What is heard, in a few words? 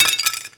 small break